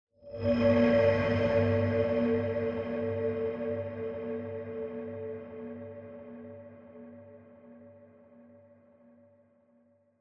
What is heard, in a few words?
Horror Accent Low Long